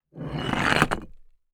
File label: Sand Pebbles; TOOLKIT vol.2